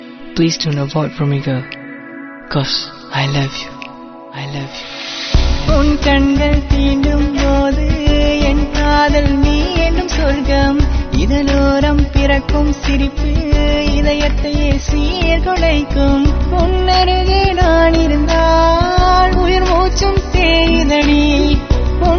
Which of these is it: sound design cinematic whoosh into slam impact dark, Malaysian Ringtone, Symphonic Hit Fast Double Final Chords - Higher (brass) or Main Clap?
Malaysian Ringtone